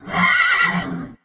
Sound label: horse attack; fauna